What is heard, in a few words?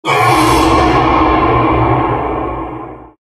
male scream, halloween; Source Engine; tf